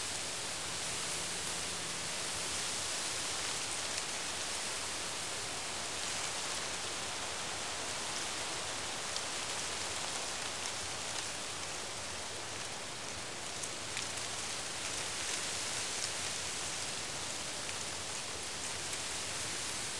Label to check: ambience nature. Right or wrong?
Right